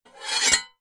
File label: sword slow scrape on metal; zapsplat